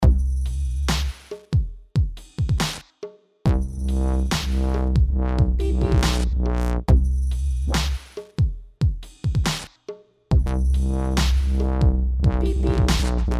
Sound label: im a ford zonder zang